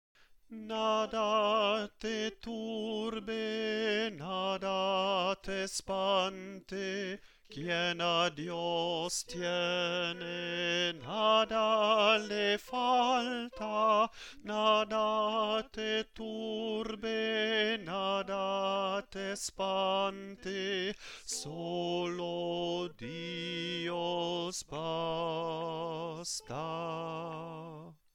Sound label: nada te turbe tenor